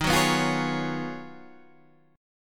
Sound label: Ab Minor 13th, Eb, guitar chord